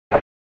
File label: SNES Fighting Swing